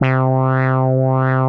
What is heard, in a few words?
Bass Lead Split; Synth Lead; Ableton; Creative Extensions; Poli